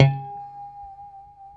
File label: D4 pick harmonic, Guitars; Spectrasonics; Hans Zimmer Guitars VOL-1; JC-HARMONICS